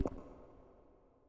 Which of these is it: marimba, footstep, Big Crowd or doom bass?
footstep